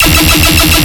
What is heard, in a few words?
Bass Hit E, Dubstep